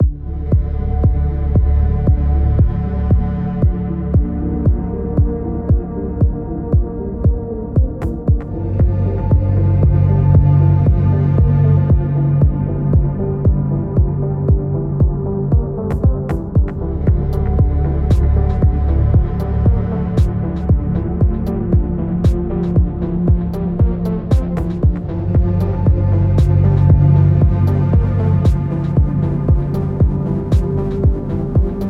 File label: Pure Analog Sweeps; vintage synthpop; Black Octopus Sound